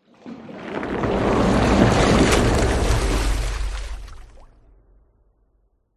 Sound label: water whoosh impacts movements; george gazetis